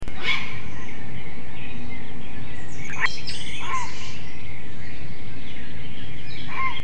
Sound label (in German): graureiher; see